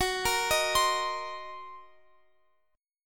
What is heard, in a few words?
Fsharp 6th Flat 5th down Guitar Open F; arpeggiated; JGuitar